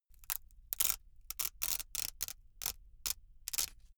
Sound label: velcro large open really slow